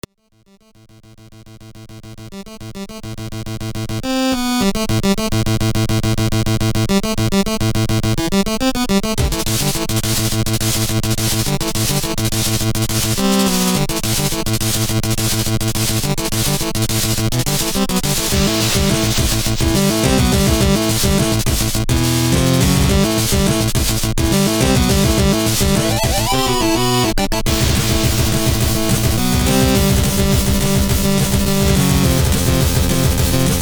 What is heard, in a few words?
chip metal